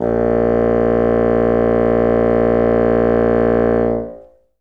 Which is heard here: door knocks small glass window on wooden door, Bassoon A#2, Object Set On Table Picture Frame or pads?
Bassoon A#2